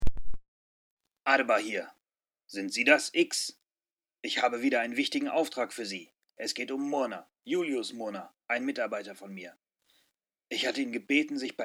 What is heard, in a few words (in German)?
anruf